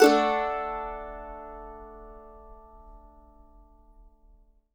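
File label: mandolin major, Country; Sampleheads; Whole Lotta Country 1 & 2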